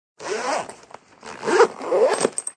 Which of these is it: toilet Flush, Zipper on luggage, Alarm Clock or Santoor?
Zipper on luggage